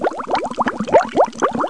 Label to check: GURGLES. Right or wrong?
Right